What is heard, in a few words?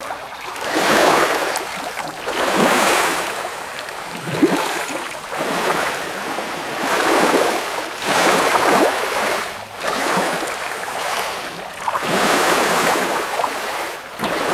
close-up choppy lake water lapping